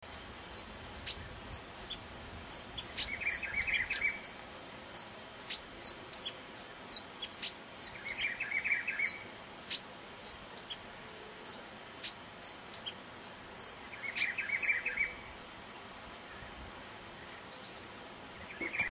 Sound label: red rumped warbling finch